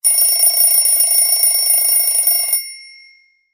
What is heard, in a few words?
Alarm; Itel itelOS 13; alarms